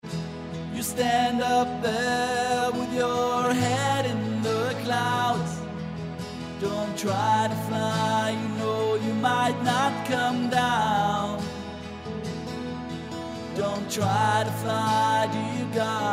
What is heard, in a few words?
Hymn